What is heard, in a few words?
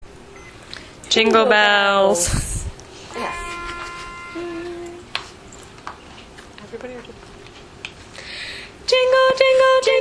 Jingle Bells Tenor